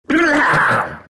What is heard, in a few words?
vipe laugh; dota; viper